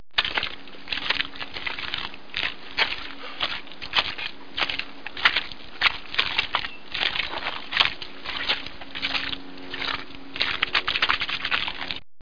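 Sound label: footstep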